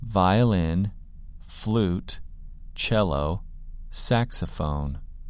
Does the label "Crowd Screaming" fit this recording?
No